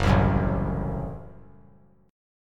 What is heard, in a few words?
Gb Diminished; strummed; JGuitar